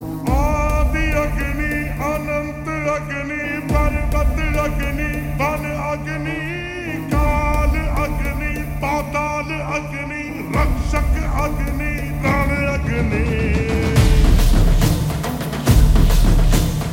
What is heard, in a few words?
Ringtone Slowed Reverb; kanguva